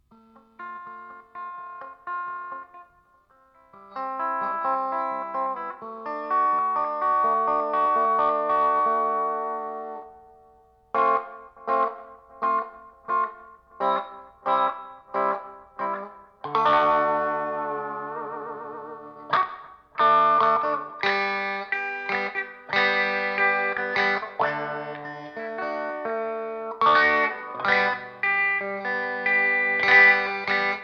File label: electric; MegaEpix